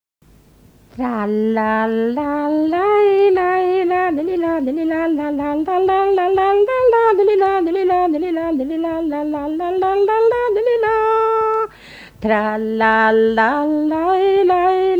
Polsktrall

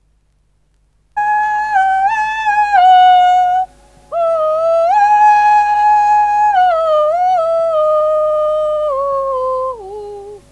Kauklåt

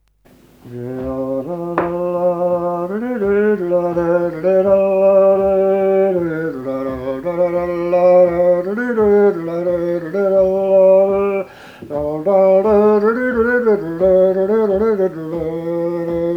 Gamla Jämtlands brudmarsch; efter farfar Gammel Ol Zackrisa

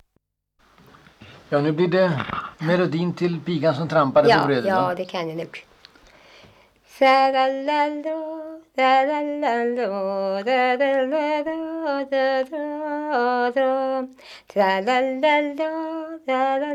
Endast melodi, Folk music of Småland; Pigan som trampade på brödet

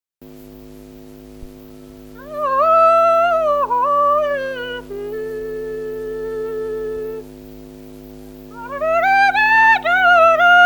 Kauklåt

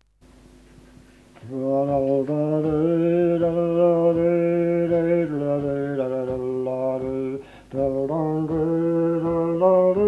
Svanlåten brudmarsch

